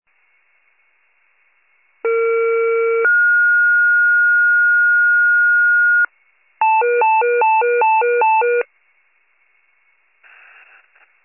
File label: fire, pager alert